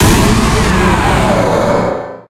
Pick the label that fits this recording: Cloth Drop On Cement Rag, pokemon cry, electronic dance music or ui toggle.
pokemon cry